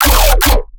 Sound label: bass hit D, Bass Stabs; Virtual Riot